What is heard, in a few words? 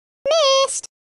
missed